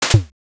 hero normal attack

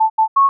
alert; agentmag

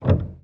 piano

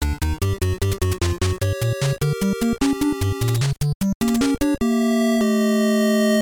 castle gate intro; castlevania

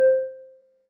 default beep

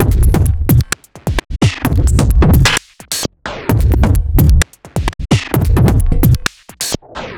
beat c

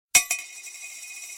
Victory

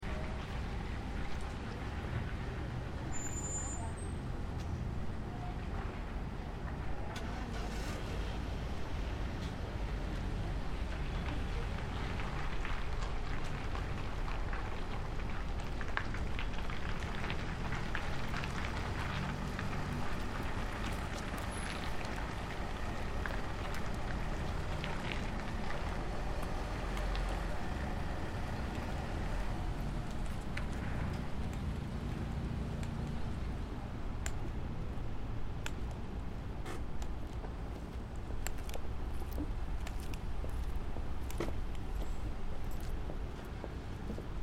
Parking lot ambience looping; 3dstreet

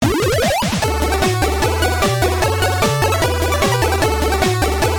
invincibility; asterix

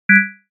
end call

